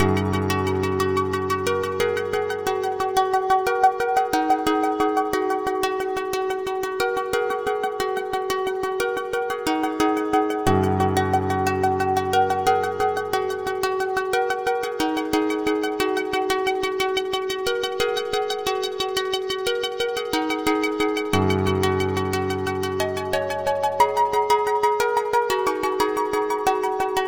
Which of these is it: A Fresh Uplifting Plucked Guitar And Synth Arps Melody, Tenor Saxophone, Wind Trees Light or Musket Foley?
A Fresh Uplifting Plucked Guitar And Synth Arps Melody